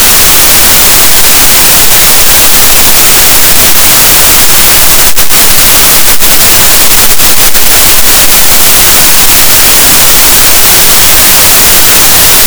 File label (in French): cachalot positif, gaussian noise, Physeter Macrocephalus